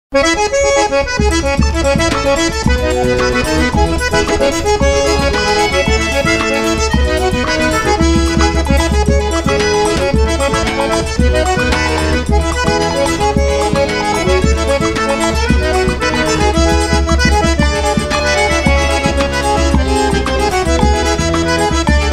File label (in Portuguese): forro pe de serra instrumental